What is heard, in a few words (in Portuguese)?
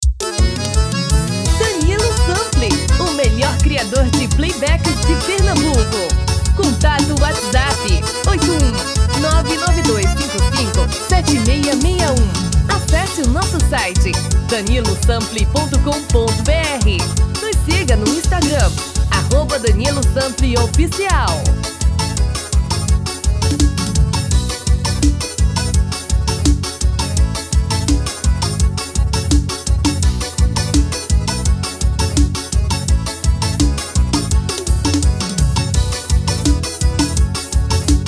tom masculino